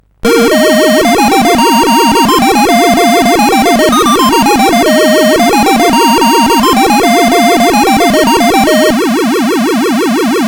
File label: Musicbox, sid CSG8580R5